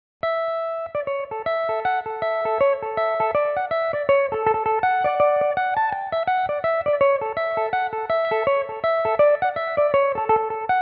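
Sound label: A mixolydian, irish mandolin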